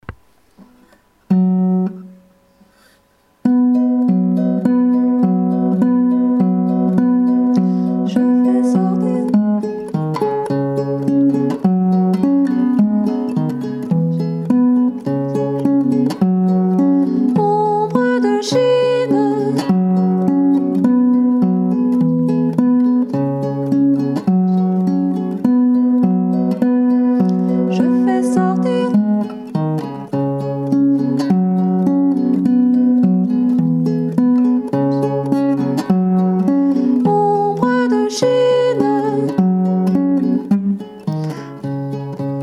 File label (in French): Ombre de Chine instrumentale